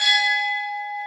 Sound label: CHIME; Roland - LA Composer vol. 1